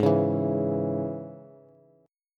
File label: Ab Suspended 4th Sharp 5th, down, Bass; strummed; JGuitar